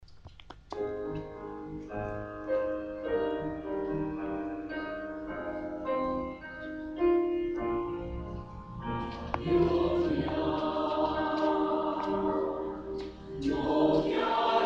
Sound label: Choir; taiwanese